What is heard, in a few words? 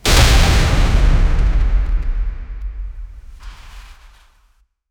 magic barrier shattered; impact